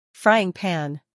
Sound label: frying pan